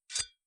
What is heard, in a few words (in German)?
Kling; Gods of Akragas